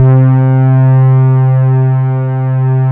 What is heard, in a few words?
pulse mod C3; Big Fish Audio; Synth City